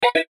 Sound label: BLEEPS STUTTER; Beep; Multimedia; Beeps